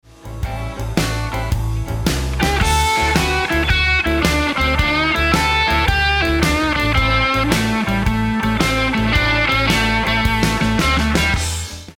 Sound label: Licks Lick, guitar